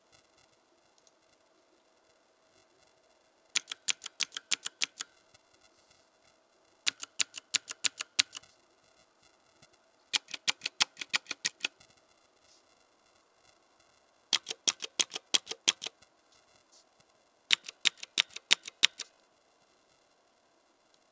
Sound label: Key Sound Fast, keyboard